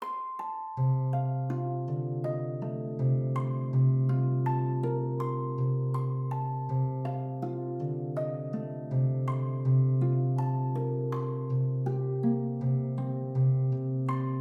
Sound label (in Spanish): Interludios para arpa y violín; Variación VII